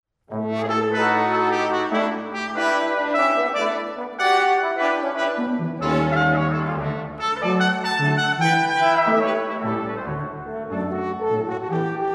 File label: calypso carol